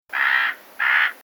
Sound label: raven; birdsong